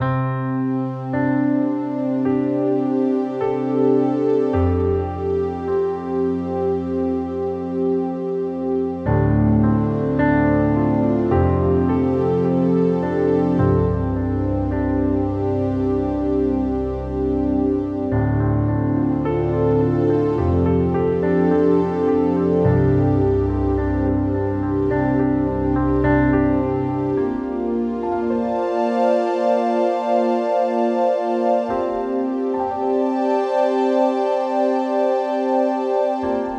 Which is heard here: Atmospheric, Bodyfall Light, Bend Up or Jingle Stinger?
Atmospheric